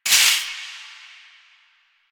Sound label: Shopping Cart Hit; Warframe; Ambience; Nineteen Ninety Nine